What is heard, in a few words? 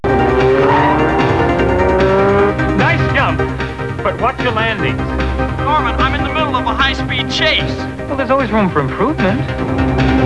jump; classictv; streethawk